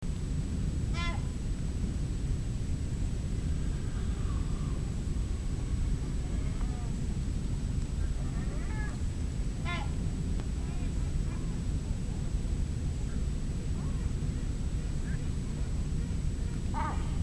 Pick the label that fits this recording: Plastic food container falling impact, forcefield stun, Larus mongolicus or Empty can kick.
Larus mongolicus